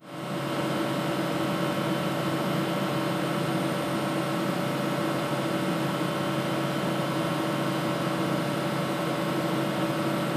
Powerful Computer Industrial

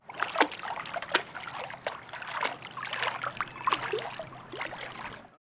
Water Sloshes, Outdoor Ambience

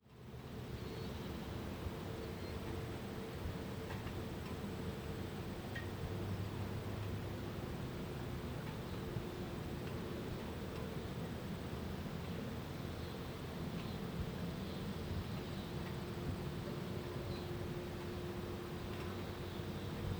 City Outskirt Outdoor Ambience

